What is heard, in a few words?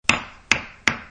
hammer sfx 3 times